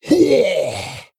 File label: Death